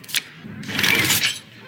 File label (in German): ziehen platyzieren; hearthstone